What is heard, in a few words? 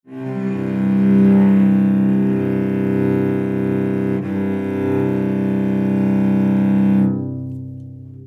cello